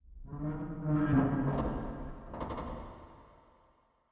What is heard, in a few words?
ambience sound; netent